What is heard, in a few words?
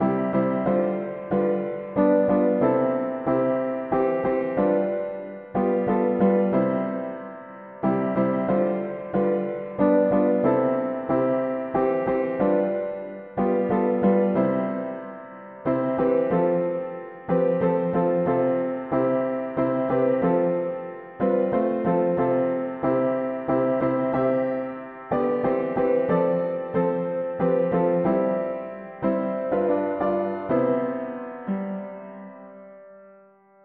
mono piano; Nous celebrons Tes louanges; hymns